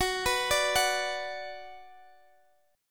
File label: Gb Suspended 4th Sharp 5th, Guitar DADGAD; x,21,21,19,21,x; down; chord shape